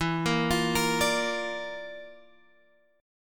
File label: Bb Major E; Bb/E arpeggiated; JGuitar